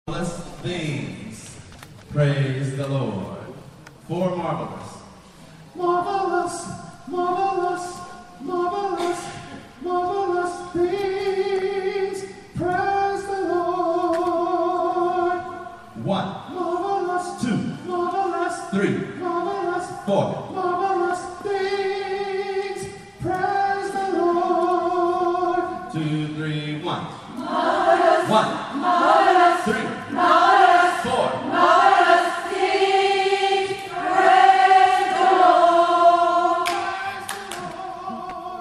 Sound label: alto